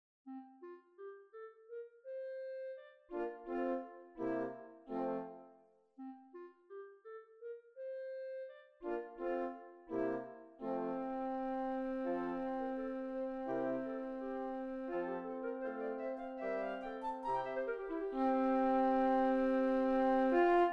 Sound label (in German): tutti